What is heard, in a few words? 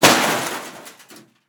Crash Car With Slight Glass Debris; crashes; AdobeCC